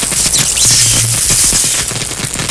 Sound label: lasergun spin